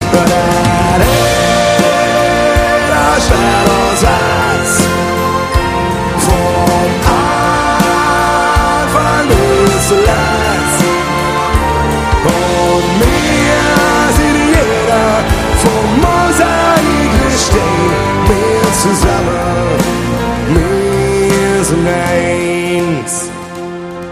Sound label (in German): live mit orchester